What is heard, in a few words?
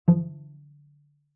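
Cello pizz fortissimo sul C E2; MIS Pitches - 2014; Strings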